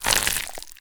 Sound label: slide; headcrab classic, movement; creatures